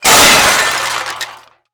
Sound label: Junk Crash; Crashes; 1001 Sound Effects